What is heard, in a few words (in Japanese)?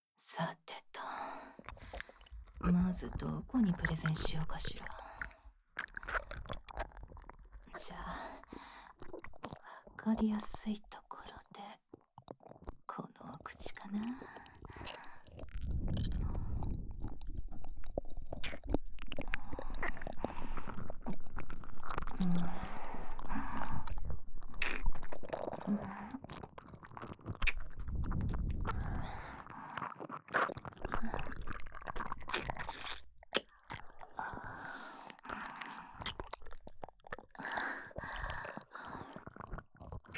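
耳舐めされながら女上司にディープキス・手コキ・フェラ・騎乗位される音声; 終電逃した女上司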